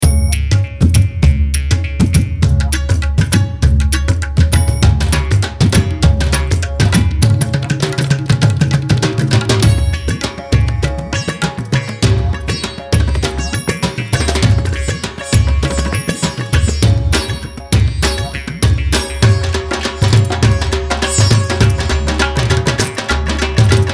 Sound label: India Ensemble Medley